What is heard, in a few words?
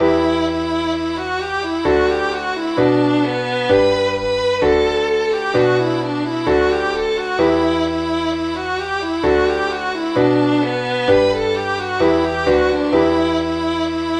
tabletop hornpipe; william taylor s